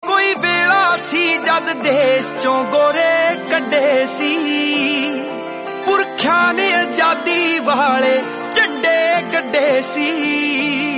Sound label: punjabi